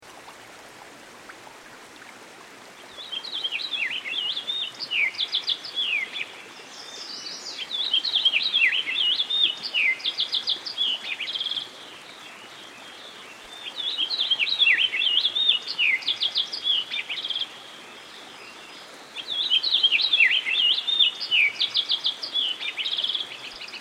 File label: American Goldfinch, Songbirds By The Stream; Dan Gibson